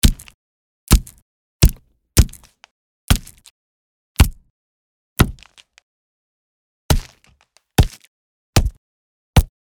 punch

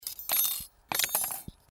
tool box

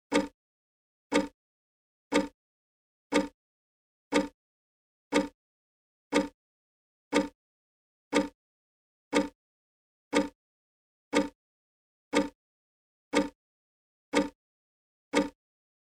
clock

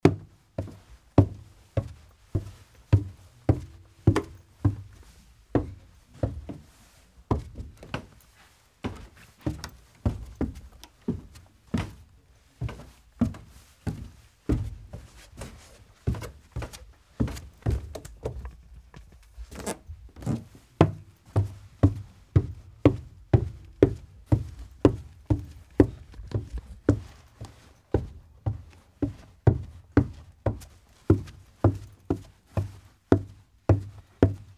footstep stairs

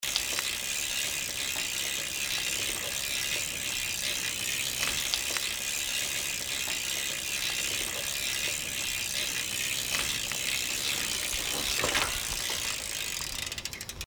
bicycle pedal middle

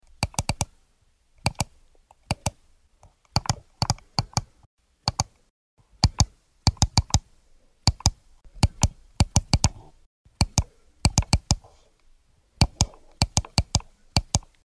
mouse

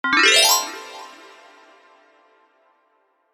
kirarin

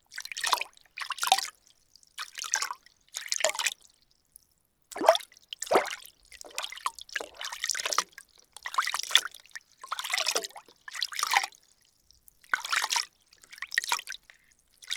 water splash

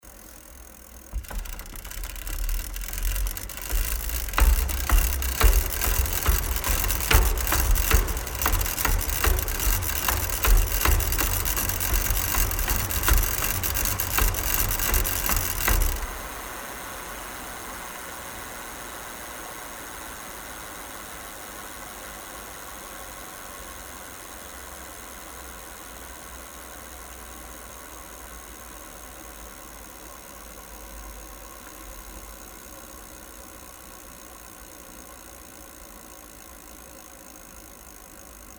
bicycle pedal fast

